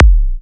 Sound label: Kick A, Psy Kick; Drums; Ghosthack; Ghosthack Advent Calendar 2017 Day 9 - Psy Kicks; Ghosthack 20 Free Psy Kick